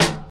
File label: clicky kick drum single hit d sharp key